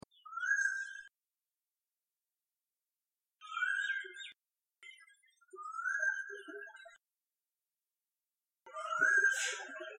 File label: oriole call